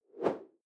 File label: whoosh simple short